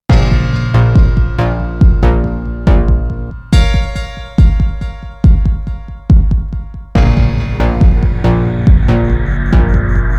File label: Theme Loop Stem; Sniper Elite: Nazi Zombie Army 2